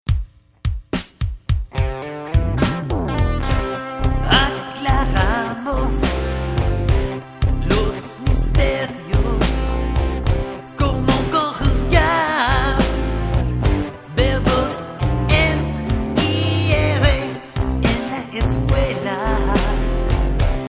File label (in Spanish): los blues, espanol